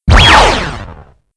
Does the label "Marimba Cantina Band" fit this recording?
No